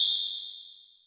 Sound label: Ringing Shaker, Percussion Hits